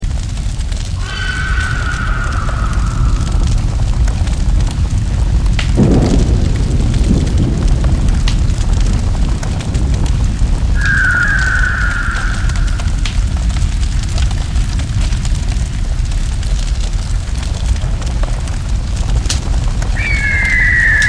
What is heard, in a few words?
haasts eagle